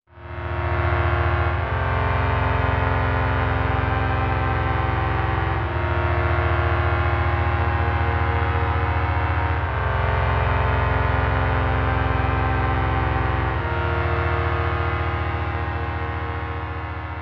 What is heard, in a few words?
Cello, mks50